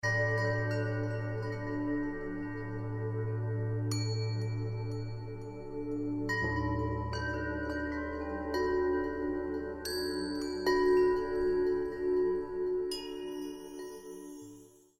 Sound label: whish chimes